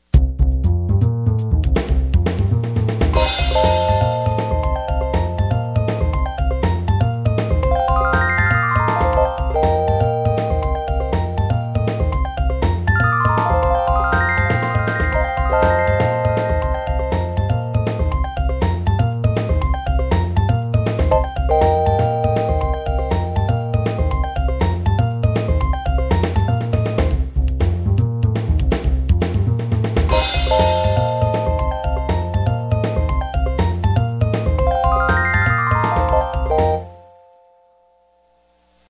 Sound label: Warning; Dragonforce